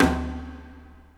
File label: KOREAN TAIK; Crypton - DrumBase5000